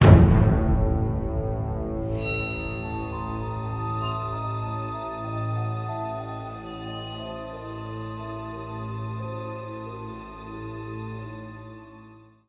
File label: String; The Bureau XCOM Declassified